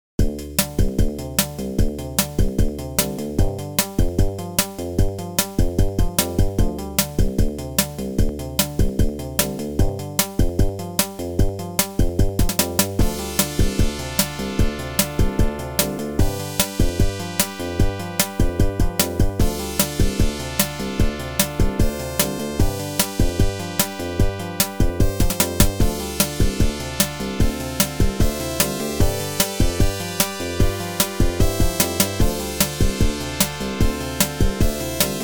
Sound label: ADLIB OPL2